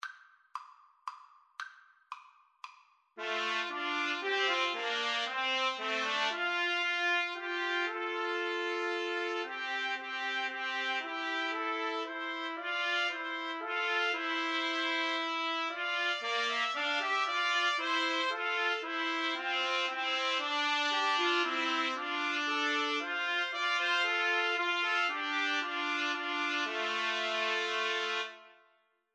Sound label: Trumpet Trio